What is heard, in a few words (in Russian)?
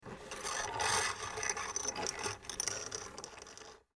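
звуки металлического троса (кабеля)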